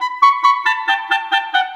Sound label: Clarinet Effect; Sonic Foundry (Sony Creative Software); Jazz Solos and Sections; Effected